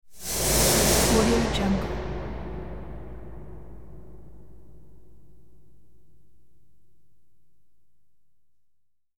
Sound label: eerie metal scrapes large rock resonant; hollywoodedge